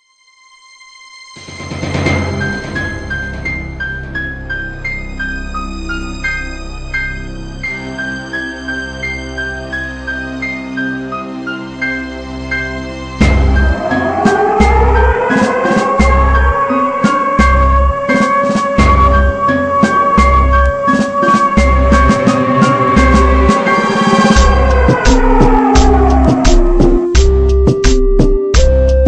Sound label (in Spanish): pista de reggaeton